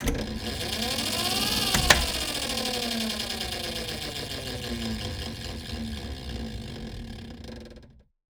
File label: transport access tube, ships; CosmicRage